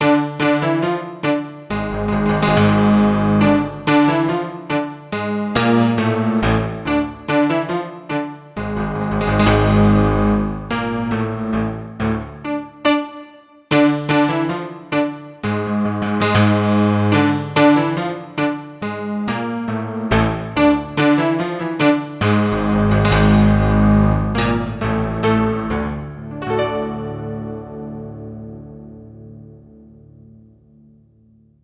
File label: slapstick menace